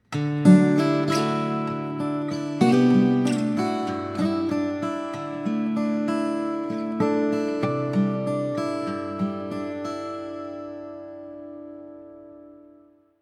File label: Gentle Glide; samsung touchwiz